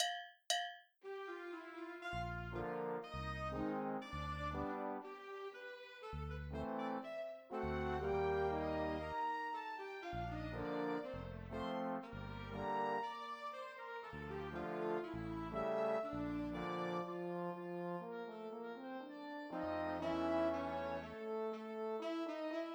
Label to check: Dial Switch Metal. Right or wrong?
Wrong